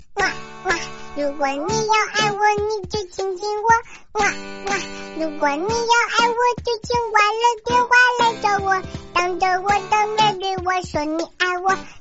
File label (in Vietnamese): nhac chuong de thuong tieng trung